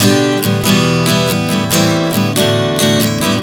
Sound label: G-Em-C-D 140 bpm, acoustic guitar chord progressions